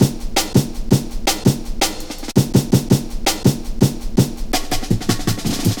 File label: Pride, Drum Loops, 165.5, Jungle; Zero-G Jungle Warfare 1, 2 & 3